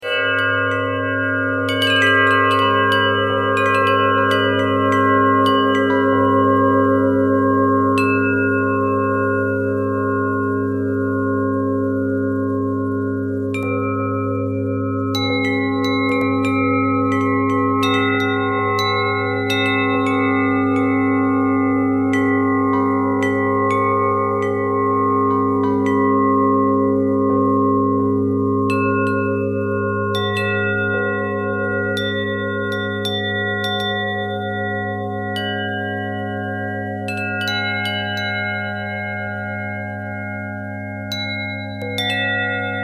bell twist

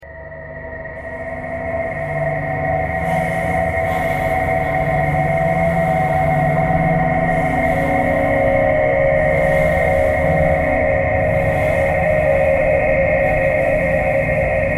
traffic k core birds thread pentatonic; bowedmetal

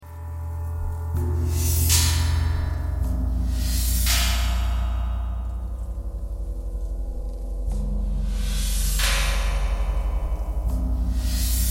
knocking thread noise

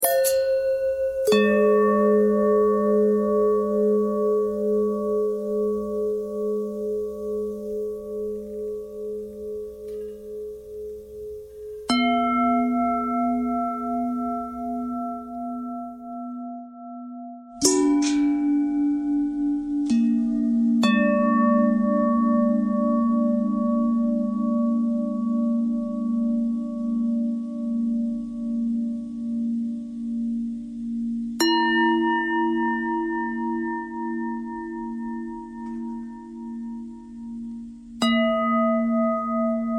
bell longbell thread bendthread; bells

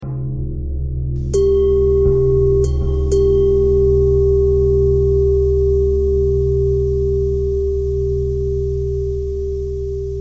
bell thread pentatonic

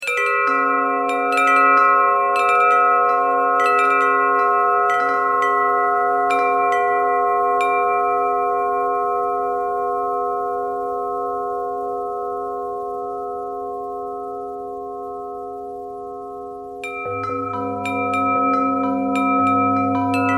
bell bell thread pentatonic, bells

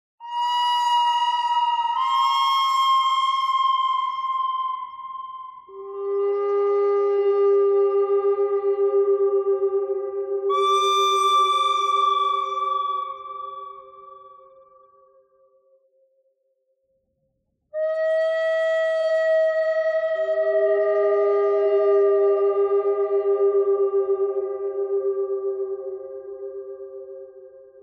bird thread noise; noreverb

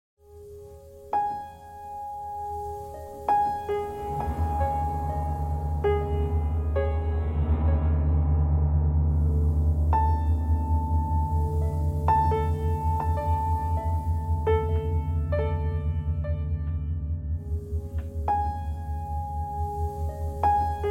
piano thread noise

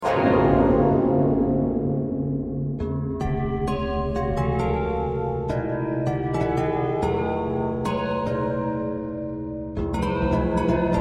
bell twist

